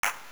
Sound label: clap